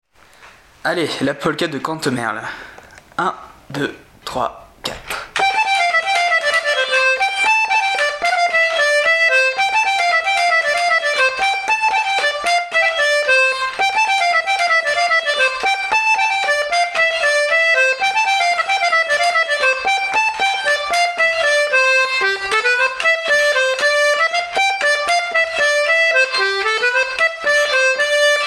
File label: Polka